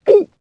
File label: gulp